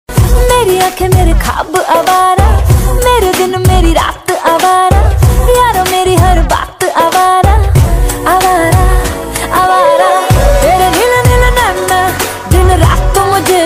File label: female ringtone; Bollywood